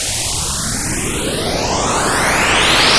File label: Noise Cheby B Lowpass